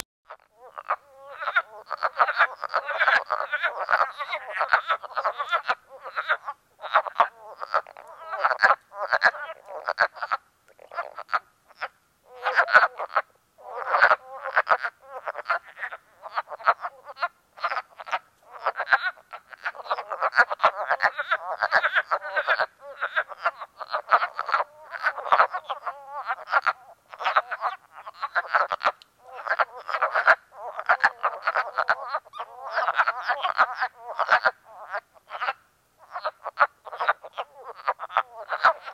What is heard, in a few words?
Wood Frog Rana Sylvatica